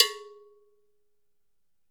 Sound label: LOW CUP GO, African perc; AKAI S6000 CD-ROM - WorldSounds